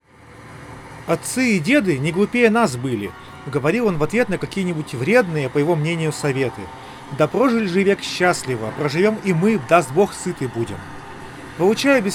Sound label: Recorder noise; huawei freebuds se 3